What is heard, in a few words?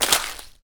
ice footstep; metrocraft